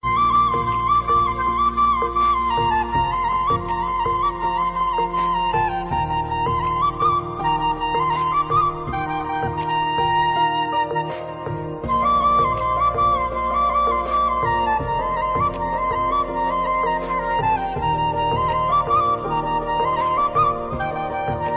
flute bgm punjabi

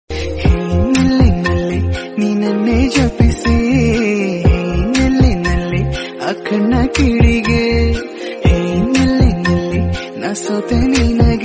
kannada love song

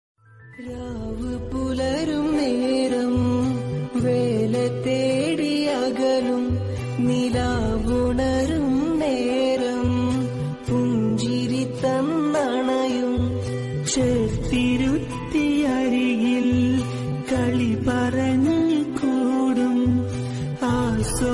father and daughter love tamil song

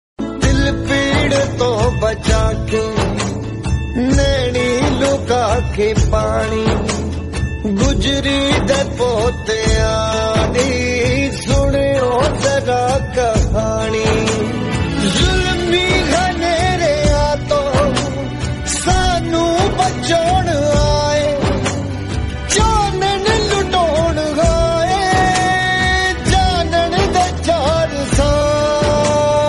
punjabi; chaar sahibzaade

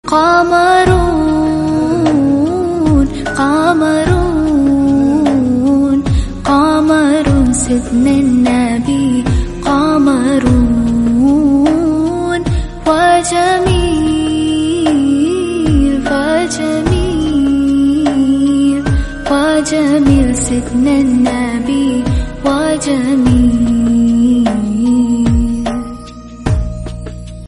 cover, English song

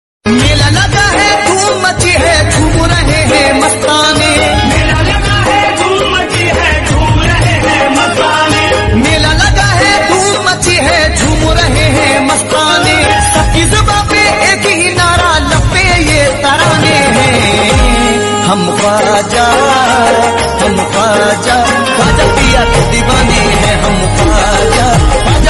qawwali jhankar qawwali

